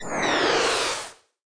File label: Encount; New The Denpa Men